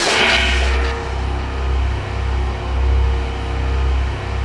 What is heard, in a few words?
ATM TONAL; Best Service - XXL Production Effects